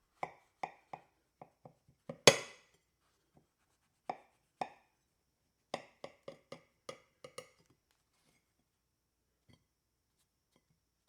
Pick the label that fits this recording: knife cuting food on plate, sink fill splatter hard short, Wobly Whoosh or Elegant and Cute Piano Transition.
knife cuting food on plate